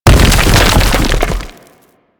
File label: Thumper Bot Thumper Impact Frack Rumble B; Warframe; Grineer